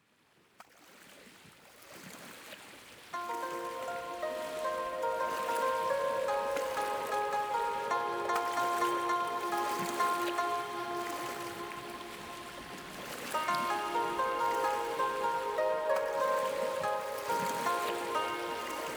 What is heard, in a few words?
Healing Ocean Waves Zone - Relaxing Sleep Oriental Music & Beach Waves